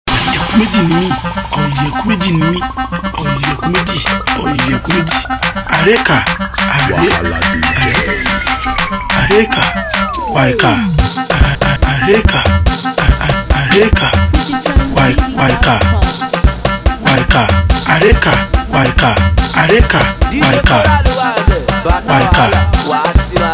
Beat

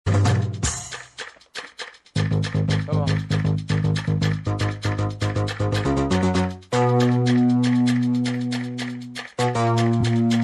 Beat

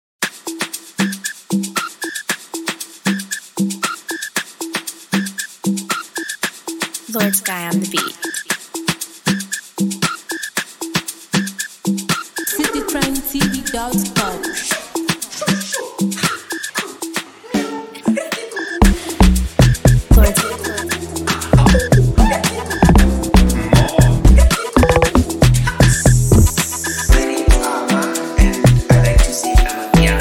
Amapiano

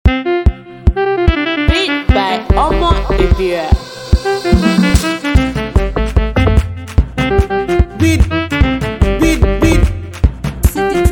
Sax Version

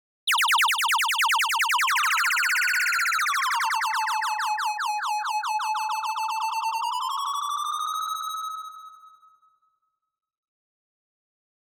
DJ Effect

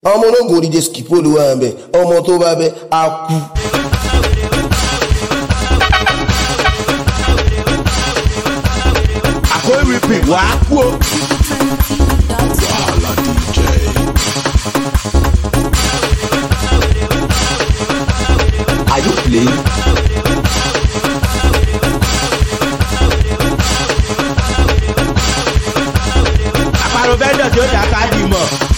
Beat

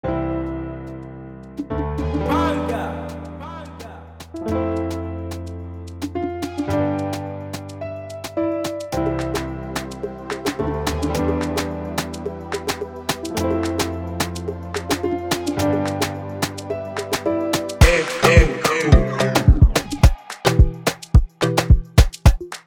Type Beat Freebeat

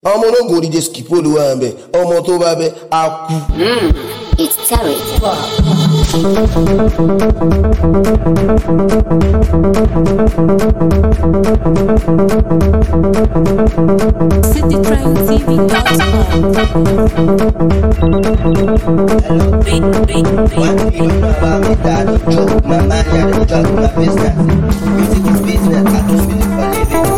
Refix Instrumental

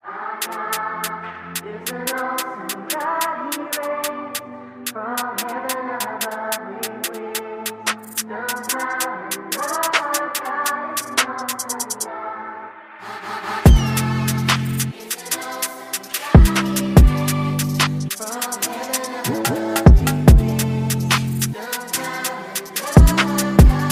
Drill Version

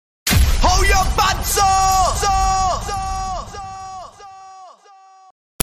DJ Effect

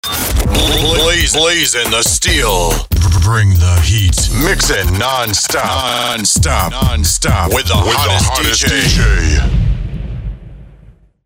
Mix NonStop

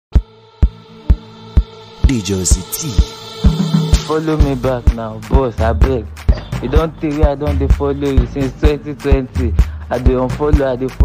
Cruise Beat

